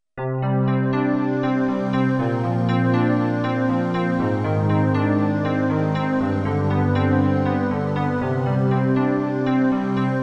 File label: Pno Elec & Guit's Ballad